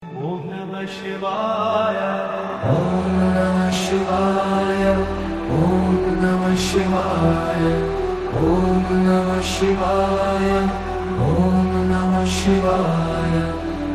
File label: om namah shivaya dhun